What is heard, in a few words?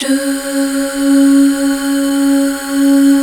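Duh Female; Best Service Gigapack 1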